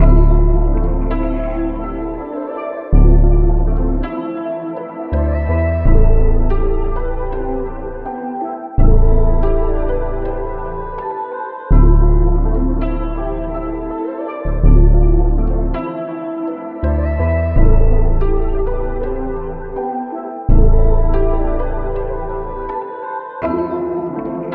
164 BPM A Min, Melodies RnB; Cymatics; ZODIAC Beta Pack

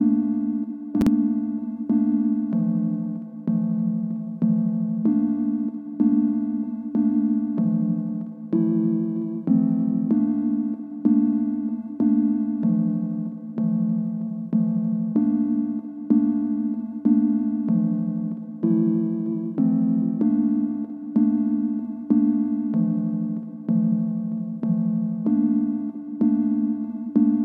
95 BPM G Min Keys; Loop Stems; Cymatics; Modern Classics

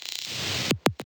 Glitch

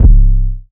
Minute 808 - C; 808s; Cymatics; 2022 Melody Mystery Expansion

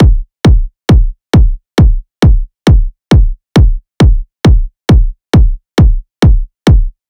Kick Loop 135, Techno Drums

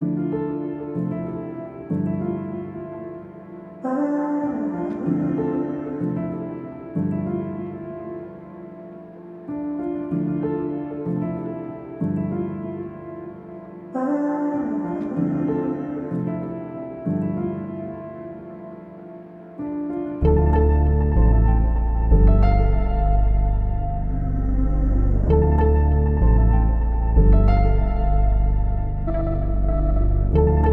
95 BPM D Min; Cymatics; Zodiac III Beta Pack; Zodiac III Production Suite

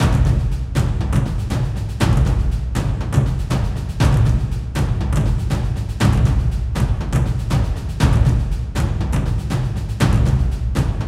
Intro Percussion 120Bpm; Construction Kits; STEMS; Ghosthack; SkyRealm; Kit 25 - Vvardenfell

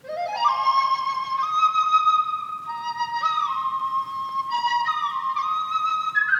Various Melody Loop - 150 BPM F Min Flute; Loop Stems; Cymatics; 2020 Melody Collection; Various Melody Loop 9

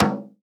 Water Jug Perc; Cymatics; PHARAOH Beta Pack; Pharaoh Premium Drum Samples (BETA); Percussion

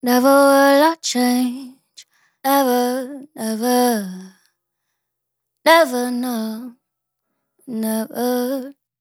Vocal Phrase Never - 100 BPM F# Min Dry; Vocals; Cymatics; ZODIAC Beta Pack

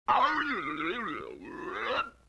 LoFi Synthetic Squawk

